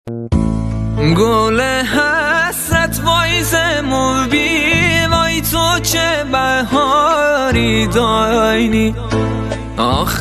music lori

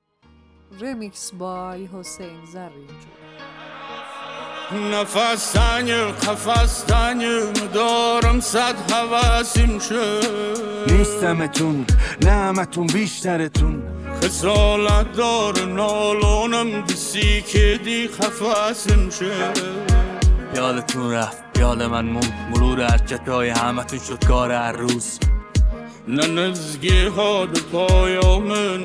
music lori

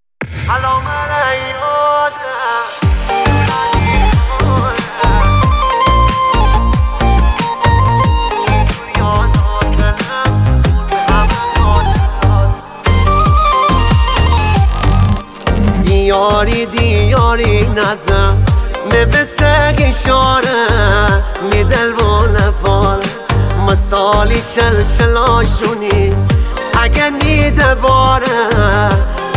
remix remix

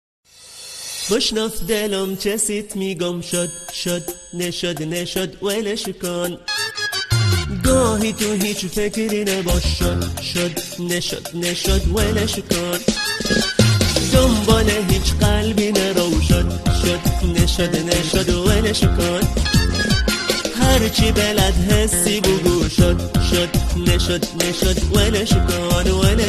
music shad sedaye zan

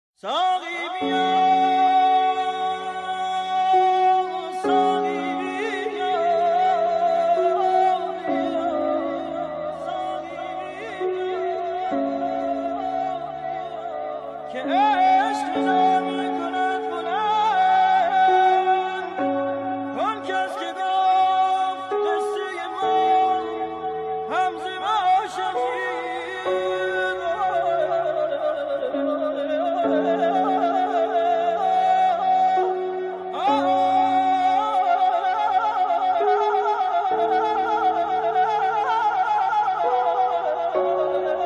pop

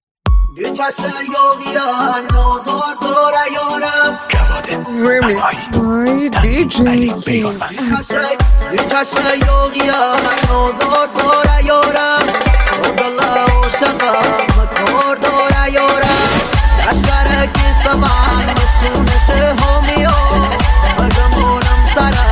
remix remix

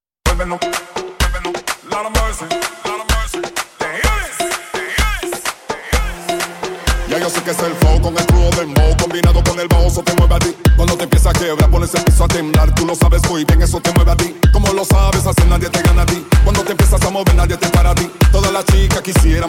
zumba fitness dance

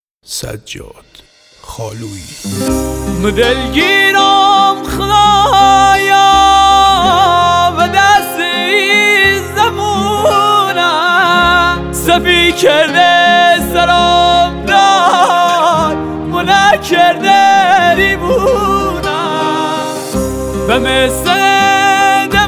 lori ghamgin khoram abadi

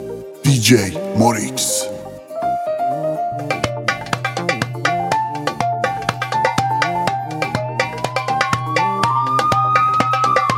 remix Mazandarani

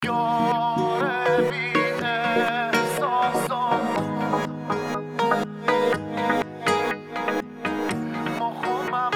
ghamgintarin ahange donya

